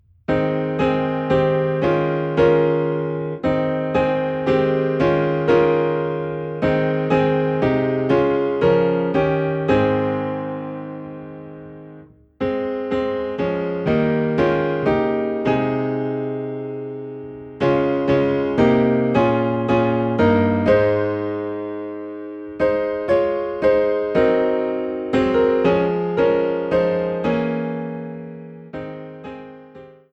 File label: Piano in A Flat